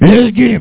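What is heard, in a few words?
monster; supr doom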